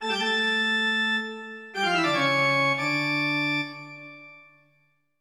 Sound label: Stinger; SpongeBob SquarePants Lights Camera Pants windows; Stereo - Dirty Bubble Trouble Cutscene